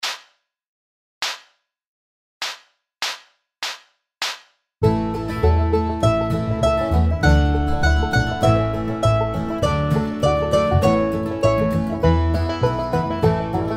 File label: Mandolin